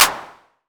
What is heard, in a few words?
909 Clap Tech; Goldbaby Tape808 & Tape909; The Tape 909